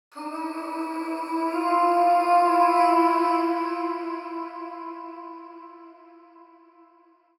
130bpm Am, Vocalpack

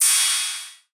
ride, cymbals, drum one shots; Rides; Cymatics; Cobra Hip Hop Sample Pack

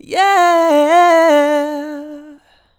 Yeaaah, Male Vocals, Ad-Libs - C Major 150BPM; Ghosthack - Free Male Vocals and Atmospheres

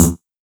bloop, Cartoon

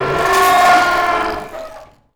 wooshes bent metal

